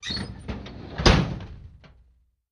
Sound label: Drawer Metal Close